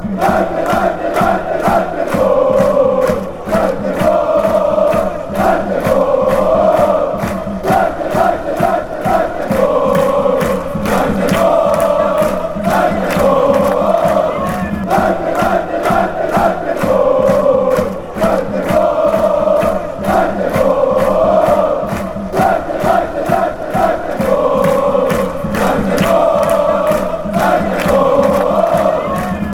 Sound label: slovenia chant